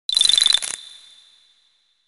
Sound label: Collect Ice Eggs; Banjo-Tooie